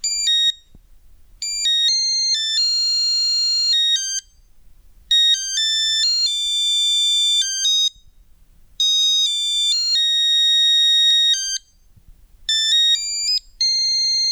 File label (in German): Eieruhr zum Mitkochen; Pop Art PiepEi